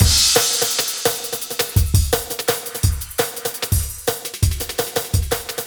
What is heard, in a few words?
Seatown Break, Breaks, Jungle; Blu Mar Ten - Jungle Jungle - 1989 to 1999 Samplepack